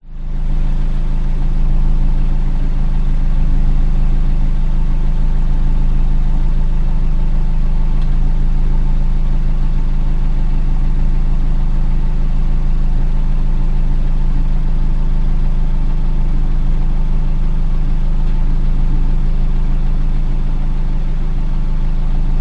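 vehicles car engine idle tail pipe exterior pov toyota corolla; zapsplat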